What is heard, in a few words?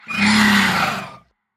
raptor scream; soundscrate